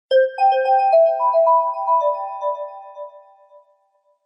Htc Sms Tone

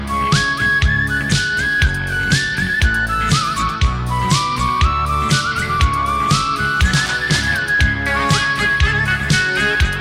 Balkan Turbo Folk

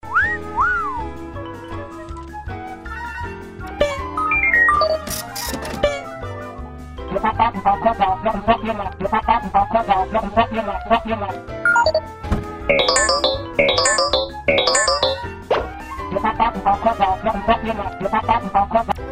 Cartoon Sound

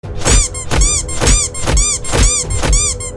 Squeak

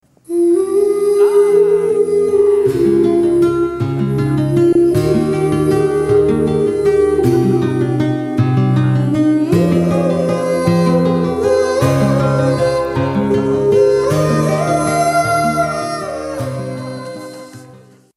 Romantic Hmmmmm

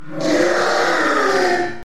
beast screem

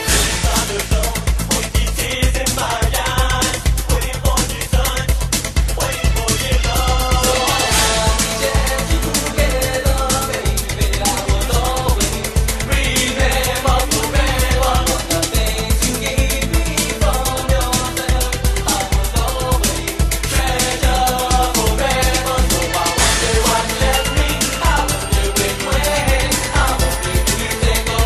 Latin Freestyle

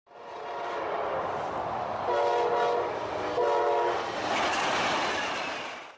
Amtrak Horn

